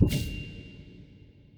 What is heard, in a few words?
fish heal buff